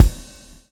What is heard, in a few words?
swing